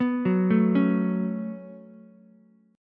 Db 7th Suspended 4th Sharp 5th Db down Ukulele; JGuitar

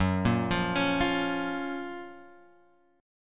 F sharp Major 7th; F#M7 Chord {2 1 3 X 2 1} arpeggiated

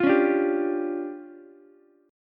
D Minor Major 7th Double Flat 5th, D, Ukulele; DmM7bb5; strummed; JGuitar

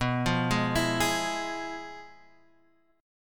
F Minor Major 7th Flat 5th, B

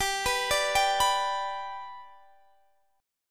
G Major, Guitar, Drop D; chordshape; down

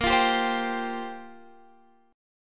Bb 6th Suspended 2nd Bb; Bb6sus2 strummed

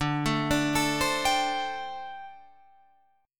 D 7th Suspended 4th, down, Guitar, Open F; D7sus4; arpeggiated

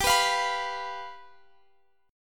G 7th G down Guitar Drop D

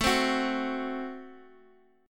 A Minor 6th A, Guitar, Standard; Am6 strummed; x,x,x,2,1,2

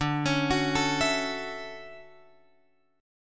D-Major 9th, D

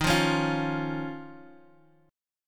D sharp 7th Suspended 2nd D sharp; strummed; 23,20,23,22; D#7sus2; JGuitar

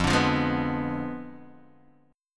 F Diminished 7th F down Guitar Open D

